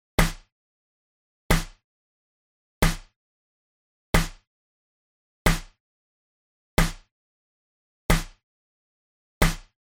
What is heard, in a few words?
Snare